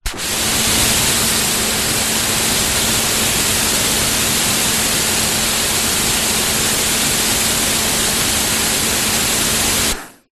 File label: industrial pressure washer k2 on run spray close to nozzle no movement; kartcher; zapsplat